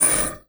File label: Flash Pop Up; Multimedia Internet CD-Rom Flash